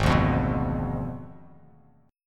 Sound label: G sharp Diminished 7th G sharp, Bass Standard; G#dim7 strummed; JGuitar